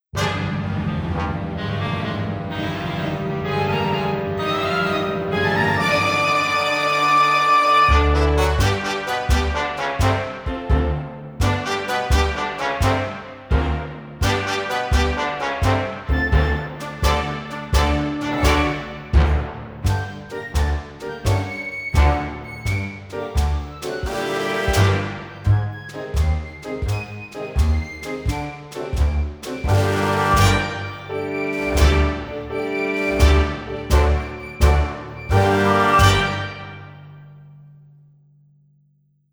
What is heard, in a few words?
Pirate March Instrumental